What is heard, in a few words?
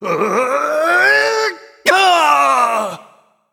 Male Nightborne Death